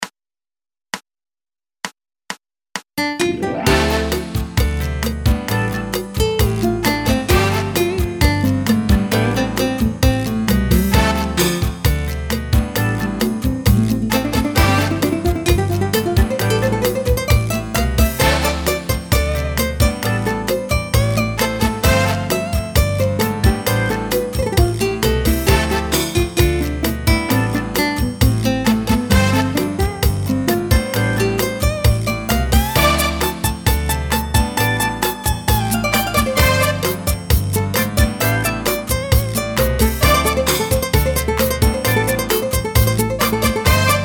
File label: Dance Improv; Let's Dance Improv Demo